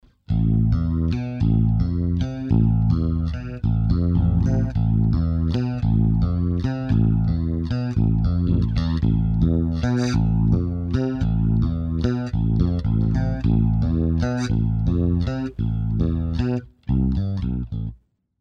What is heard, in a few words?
Flanger